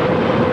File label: nebel loop; garrysmod; gbombs